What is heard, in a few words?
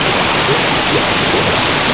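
waterfall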